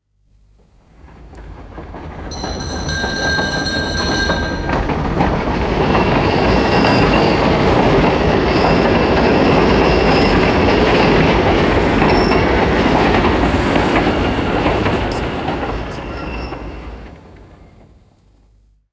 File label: vintage tram passing by with bell; trains